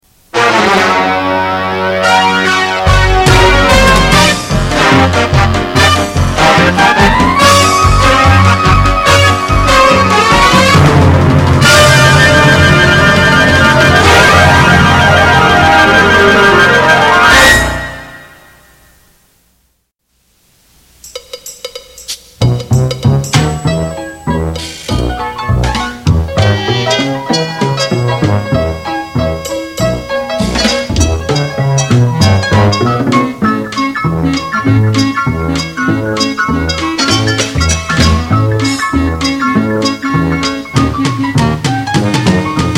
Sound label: swing